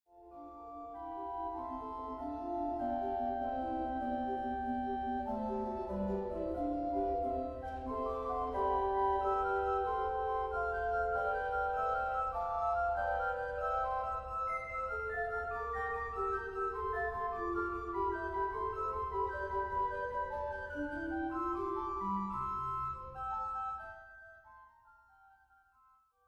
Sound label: Fuga C